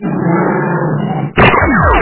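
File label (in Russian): монстр выстрелы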